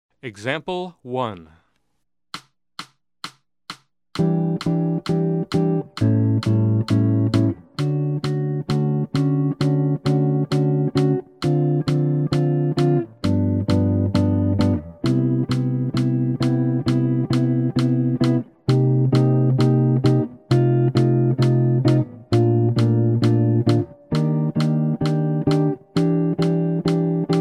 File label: Jazz; jue shi ji ta